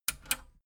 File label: metal button radio click disable fast